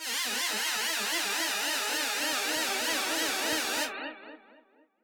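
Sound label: Modulation Processed, alarm siren samples 125bpm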